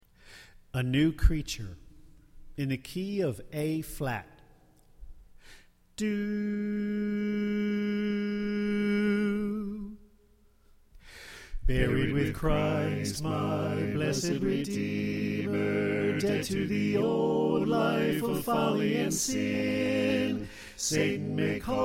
Bass Left

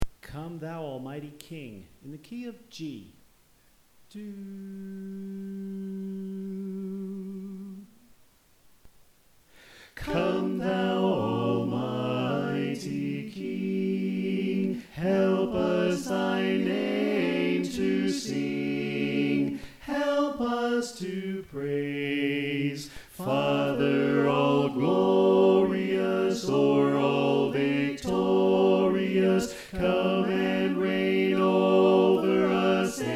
Tenor Left; Come Thou Almighty King

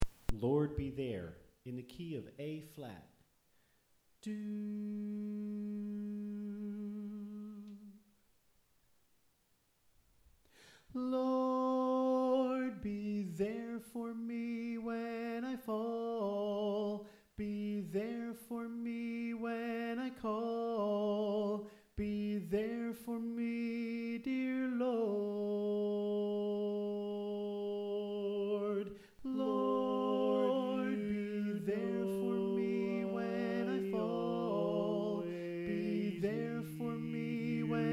Alto

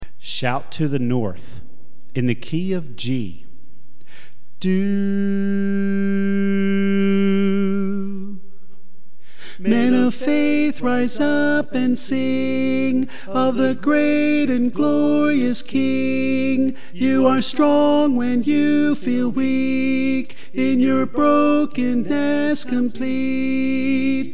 Tenor

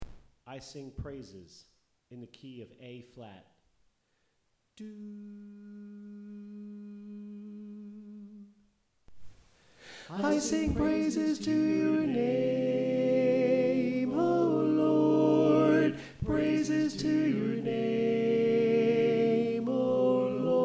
Alto

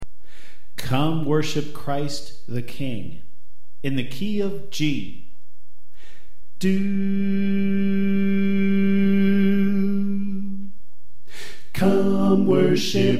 Alto Left

